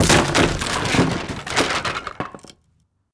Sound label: wood gib; Rust